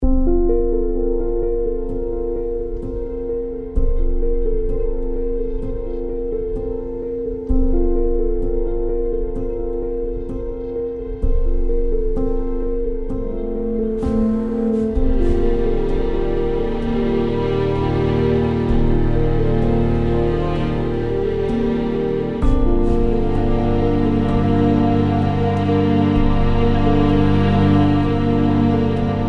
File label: Drama Emotional